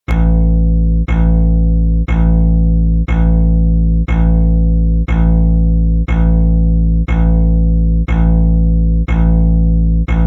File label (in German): A1 Ukulele Kontrabass